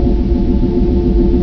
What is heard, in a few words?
generator; XDM; ambience